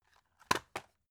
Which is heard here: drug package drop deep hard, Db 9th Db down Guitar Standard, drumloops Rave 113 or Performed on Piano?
drug package drop deep hard